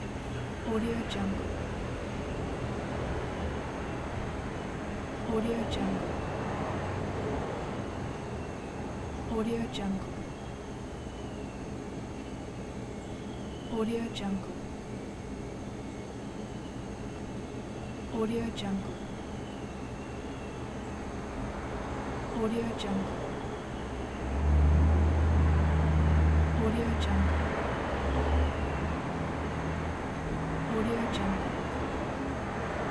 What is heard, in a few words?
apartment interior ambience